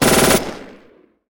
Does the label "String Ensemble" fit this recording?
No